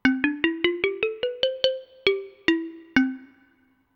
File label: xylophone short